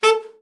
ALT SRT, ALTSAX SHORT; Giga Samples Collection

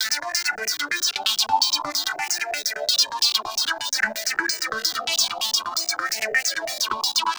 ambisonix D 130, synth loop; Synth Loops; Zero-G; Soundclash Nu Dance Electro; Acidized; 130bpm

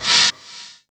RISK FX; USB Soundscan vol.24; AKAI; Industrial Loops

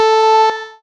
lead syn, Techno Trance

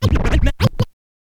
scratch kit, vinyl

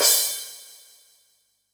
CRASH, Yamaha DD-65 CYMB

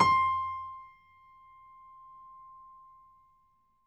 piano; East West; The Ultimate Piano Collection

